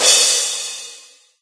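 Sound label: crash splash; Tank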